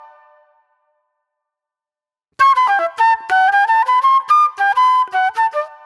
Classic Soul Flute; Sonic Foundry (Sony Creative Software); Manhattan Flutes; Soul 1

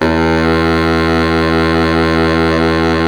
SAX Bass Sax E 2

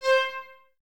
VLS C, Violas, Detache; String Ensembles; Miroslav Vitous

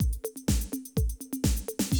125, Classic Drum Loops

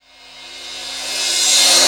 NOISE, Production Effects

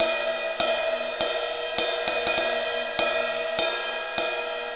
Ride Cymbal Pattern; Essential Percussion; Ride Cymbal Patterns

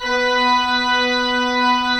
MANUAL B3, Pipe Organ; E-MU Producer Series Vol. 2 – More Studio Essentials; Keyboards

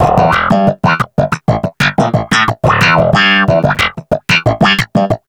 Wah Snap in E, Snap Bass; Basses

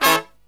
LOW CHORD, Killer Brass Riffs

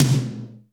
AMB T, HALL KITS; Crypton - DrumBase5000

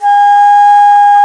alto bansuri; Winds; Bansuris; E-MU Producer Series Vol. 7 – Old World Instruments

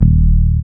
Synth Bass, Roland

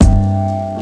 hit, brass

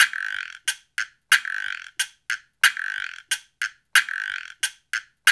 93 CHA, Cha Cha Cha, African Contemporary; Spectrasonics; Supreme Beats

